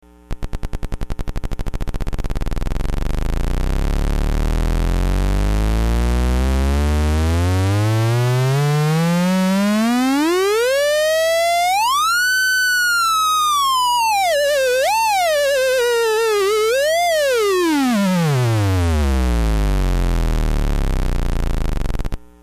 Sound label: optical theremin